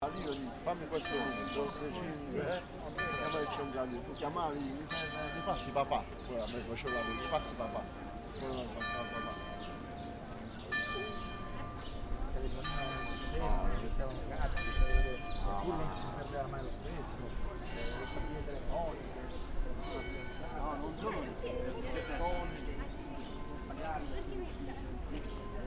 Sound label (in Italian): campane nei pressi di un panificio; world listening day 2014 one minute soundscapes